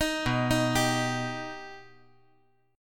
B Augmented B, chord

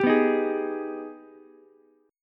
Ab Minor Major 7th, down, Ukulele; AbmM7; strummed

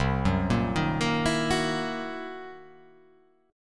C 7th Sharp 9th Flat 5th C; arpeggiated; JGuitar

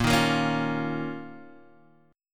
D sharp 7th Suspended 2nd A sharp, Guitar

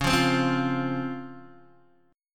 C sharp Major 7th C sharp Guitar Open D

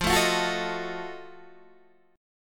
F 7th Flat 9th, Guitar Open D

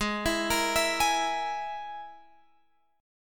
G sharp Suspended 2nd Sharp 5th, Guitar Drop D; 18,19,20,21,21,x

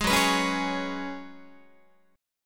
Gb Suspended 2nd Suspended 4th, Guitar; strummed; 11,14,14,11,12,12; 4A,4E,5A,5D,5G#,6C#

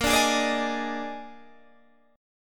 Bb 11th, Guitar, Open D

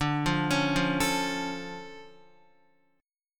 D Minor Major 7th D down Guitar; DmM7 arpeggiated; JGuitar

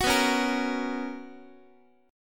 B Major 7th Suspended 2nd Guitar, chord; BM7sus2; strummed; JGuitar

